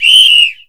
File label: Ref Whistle General